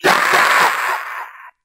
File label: scream 8 bit fighting guy; ooh ahh